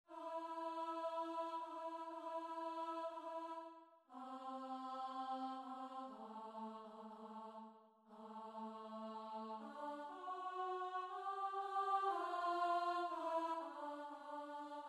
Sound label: ALTO; Locus Iste (Bruckner)